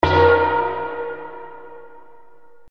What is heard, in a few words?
crystal; the monster plane